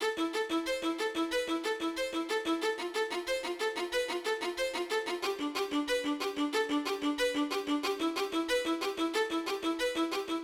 Violin 92bpm Eminor

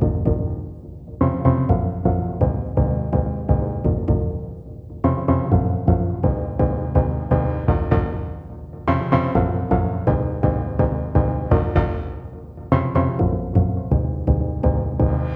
Chord Loop 125bpm Fmin

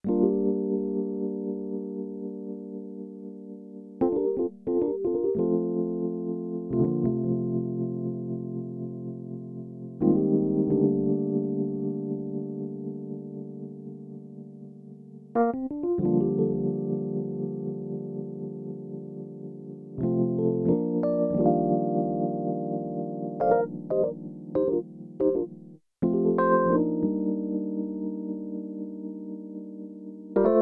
Keys

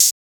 shaker

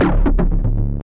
D mid range

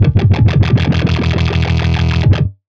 E Fuzzed Bass

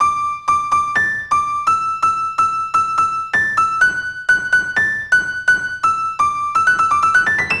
Mono Piano 126 Bm

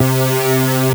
C Trance Lead

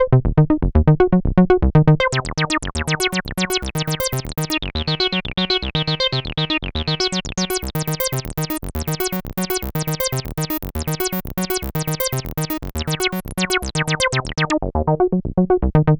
TB acid 170

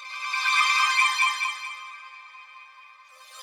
Screech Synth

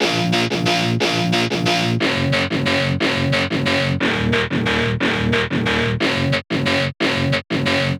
120 guitar loop weird D-min

